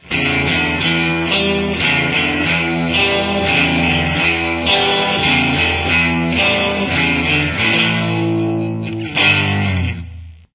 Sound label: This strange riff in 5/8 time is the primary riff of the song.; guitar; Most of the song features this riff played over and over.